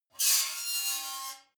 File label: metal squeal bright fast